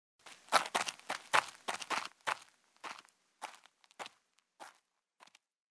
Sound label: Footsteps; Age of Empires III; cinematics